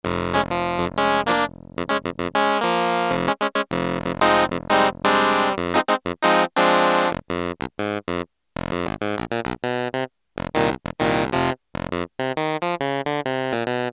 Clav, Roland JX-3P

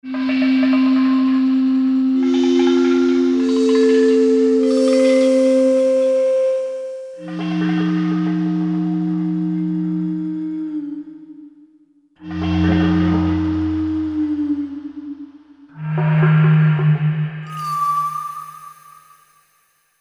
Foggy Night, Korg MSC-14S Sound Effects

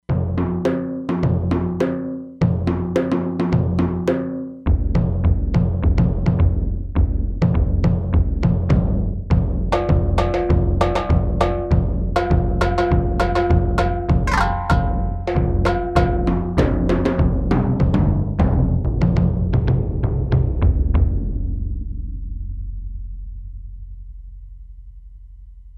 Miya Daiko, E-mu Proteus-3; Factory patches

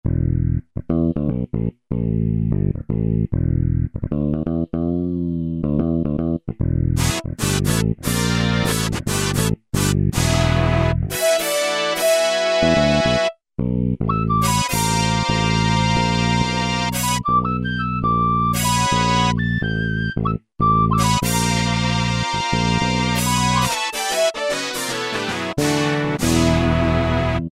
Hoot Tube, E-mu Morpheus; Factory Presets

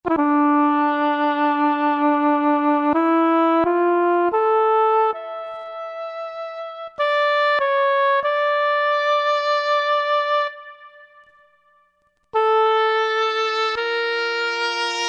Wind Ctrler, Roland V-Synth

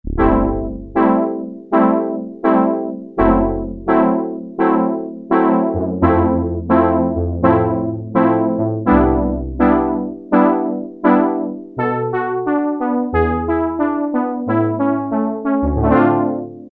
Mellow Brass; Factory Presets Bank B

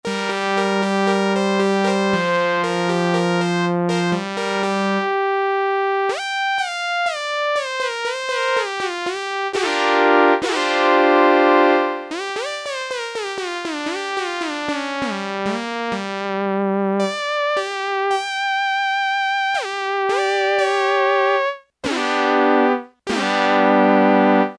SYNTHESIZER, Yamaha SHS-10

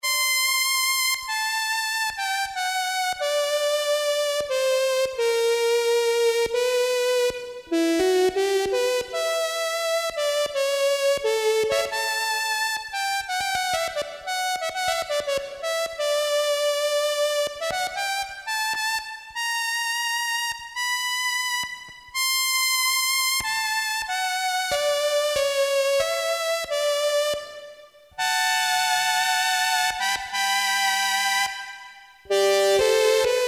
Viola, Farfisa Syntorchestra, Poli

